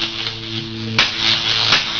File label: Electricity